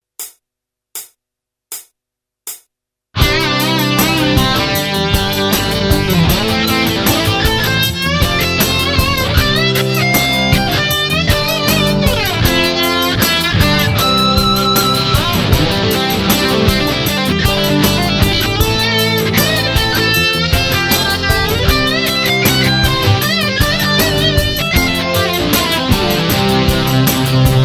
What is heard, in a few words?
solo